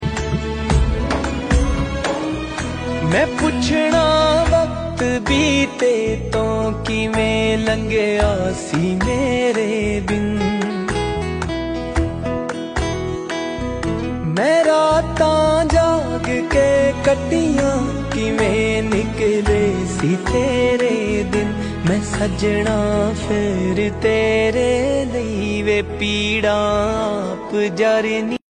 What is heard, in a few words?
Ringtone